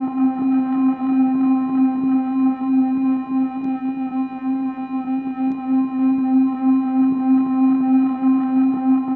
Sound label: L R reversing Loop; radiosonics